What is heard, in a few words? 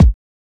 Kicks